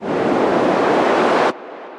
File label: Swish Ascend; Effects; Ableton; Factory Packs; Beat Tools